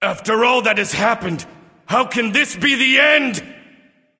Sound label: death; smite; thanatos